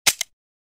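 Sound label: when the cartridges ran out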